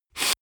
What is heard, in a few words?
nose sniff male hard; koerpergeraeusche; menschen; soundpool